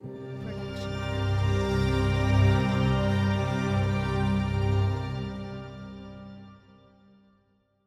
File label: Inspiring Ident; SoundsCrate